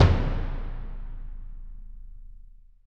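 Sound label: orchestral bass drum roll; Orch BD Roll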